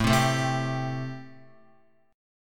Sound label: A-Minor 7th A Guitar; x,0,0,2,0,3; Am7 strummed; JGuitar